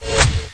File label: Bonus Collect; GLScene; AirBlast